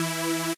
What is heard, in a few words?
lead